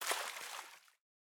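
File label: Splash Small; boomerang fu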